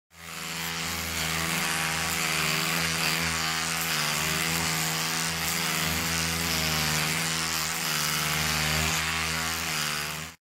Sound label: electric toothbrush; مسواک برقی